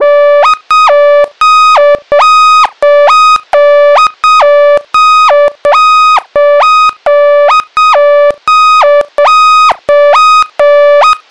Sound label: warning alarm siren